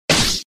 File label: Sfx Lancer Attack Hit